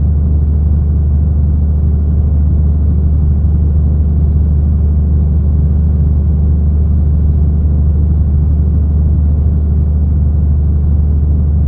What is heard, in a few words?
DC13, volvo 9900; Freyfurt